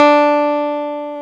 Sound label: KEY FM, FM Clavi; Club-50 - Foundations Roland; Clavinets